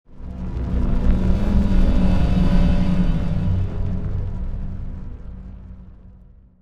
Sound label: black hole opening; dogma